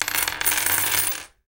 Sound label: coins